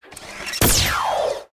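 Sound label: GRENADE LAUNCHER MIDDLE, Weapon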